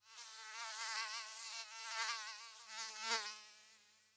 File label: mosquito; imperialriches